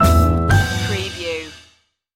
jolly old st nicholas jazz stinger

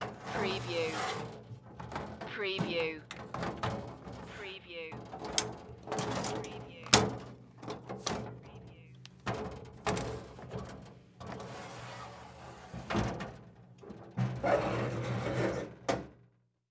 X-Ray Machine Movement; Sound Effects Bible

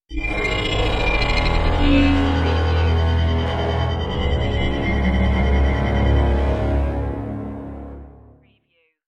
Dark Production Stinger

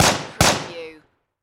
Machine Gun M4A2

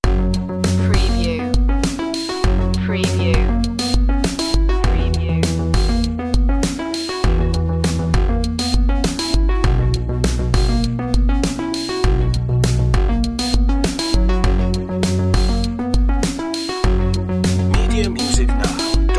electro hop loop

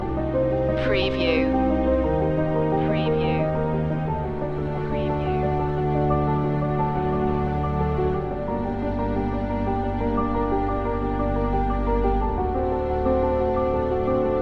motion loop

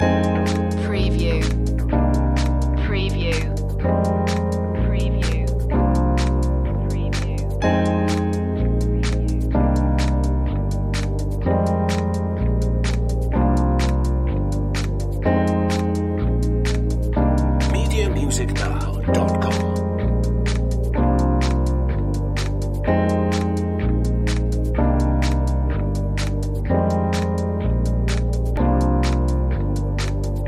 funkalectual loop

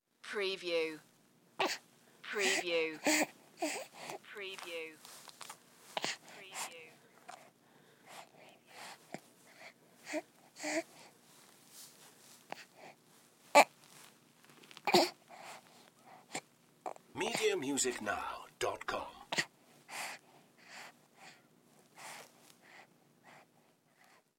Baby Fuss Long; sound effects bible

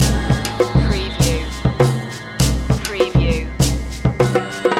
indianshop loop

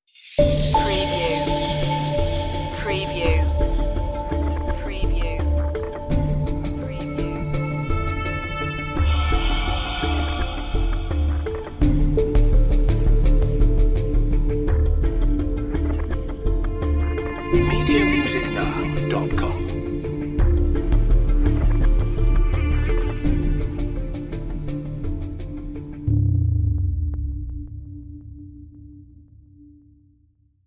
underworld series dramatic cue

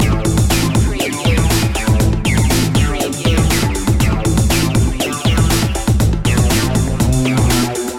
unnatural science loop

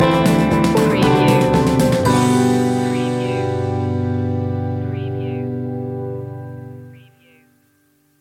gentle rain stinger

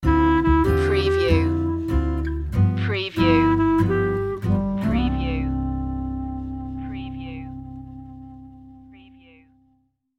sting

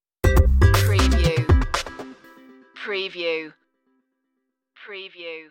bustle hustle stinger

